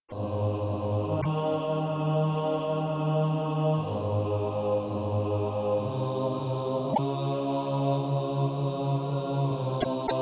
bas; Jesus calls us

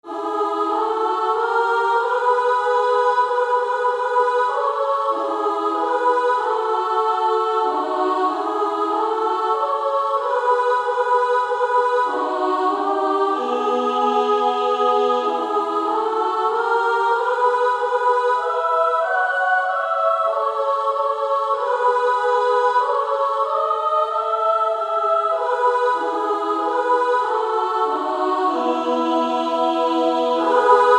Female Choir

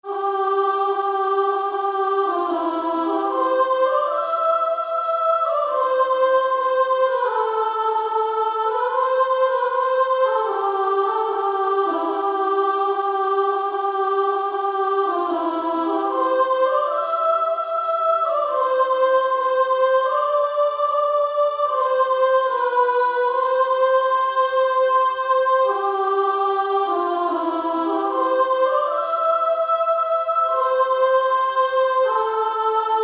Female Choir

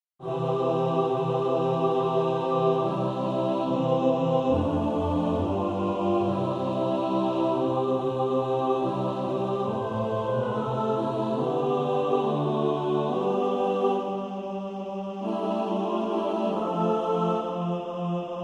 mixed

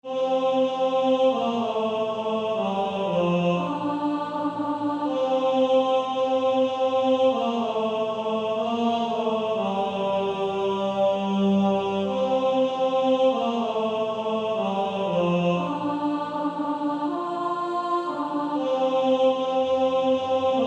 Male Choir; 1st Tenor; TTBB

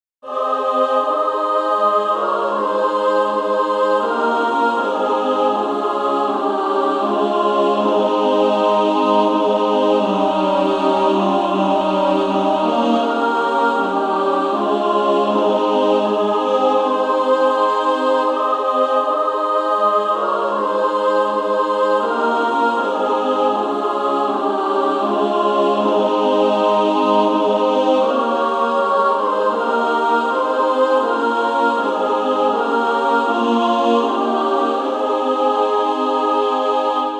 Female Choir; SSAA

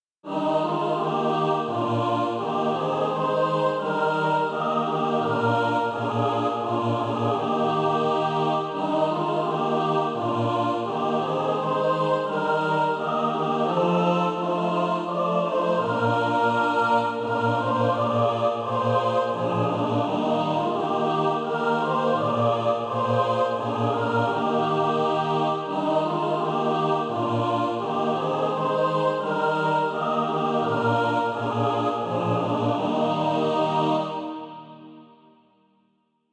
mixed; A Light Behind the Cloud

